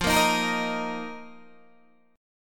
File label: F 7th Suspended 4th F, Guitar, DADGAD